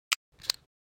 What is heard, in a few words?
switch clicks; soundscrate